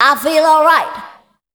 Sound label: PHRASE, Voice Spectral; Best Service; Voice Spectral II